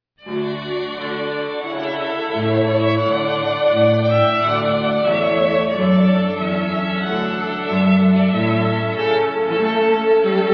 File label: trumpet; Trumpet Voluntary by Jeremiah Clarke